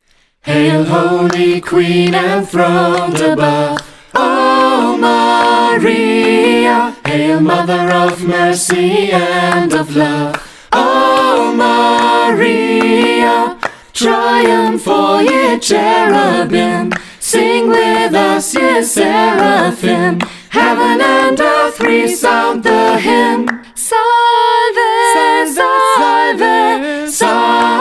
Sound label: a cappella; Hail Holy Queen